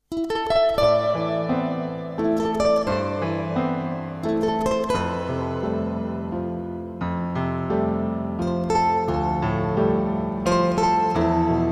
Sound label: slow waltz ocean waltz instrumental